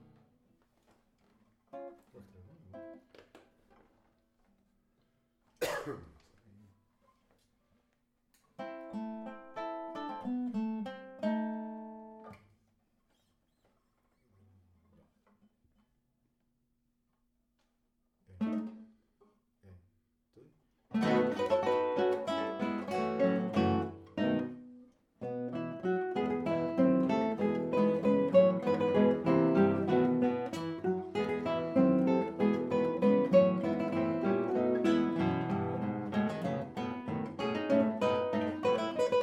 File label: guitar